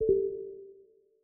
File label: Windows Message Nudge; Windows 11; Dark